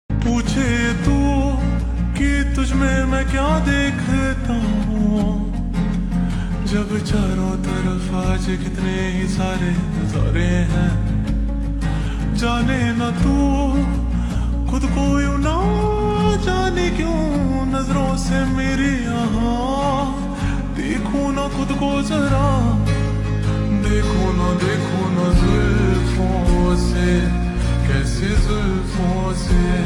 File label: Ringtone Slowed Reverb